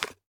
Remove Item

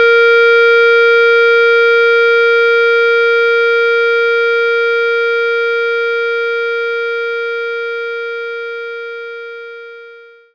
clarinet Bb4

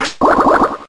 Poison Sting

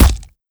bullethit player

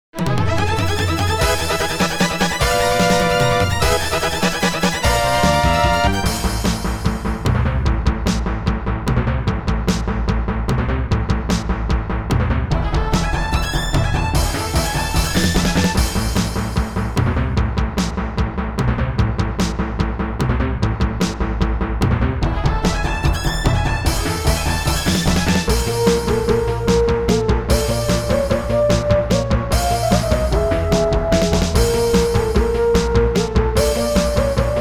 BOSS STAGE CLEAR (MT-32); Phalanx

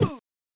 Injured (Male); Steven Seagal Is the Final Option; prototype; snes

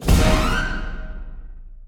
Final Battle - Combo Stab

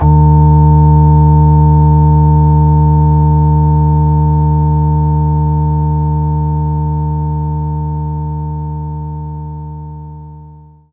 Hammond Organ

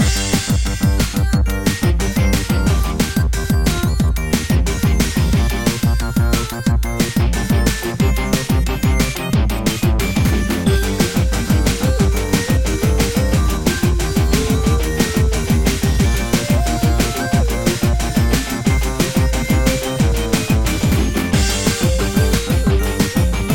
Remix Crescendo; Bot Gaiden; ps4 ps5 switch windows xbox one xbox series xs

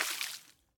stomp water end; Scrat

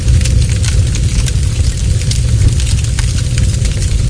Vehicles Generic

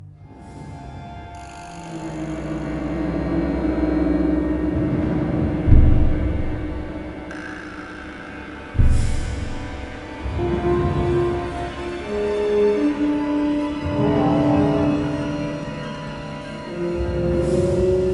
Front Gate

